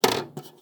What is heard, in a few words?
VHS Handling; SoundsCrate